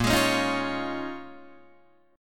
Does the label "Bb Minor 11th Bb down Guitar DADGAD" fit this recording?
Yes